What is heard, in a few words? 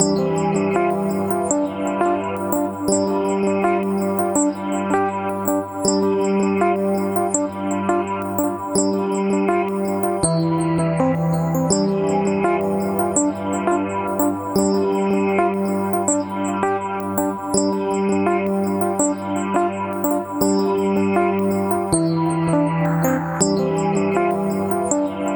drone